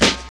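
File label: snare drum; E-Lab; X Static Goldmine 1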